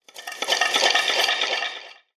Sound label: Notification